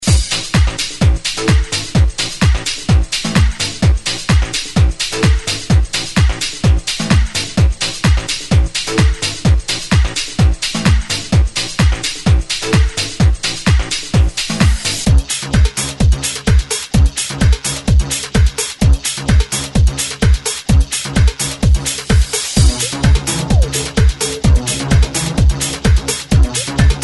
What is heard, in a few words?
mixed grooves